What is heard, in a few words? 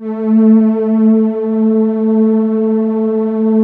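JUP8 strings A2, Jupiter 8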